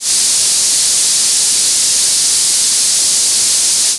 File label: Noise Fricative TH